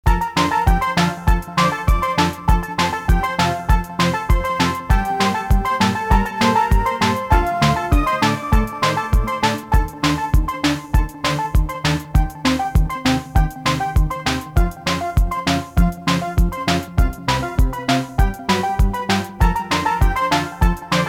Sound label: blues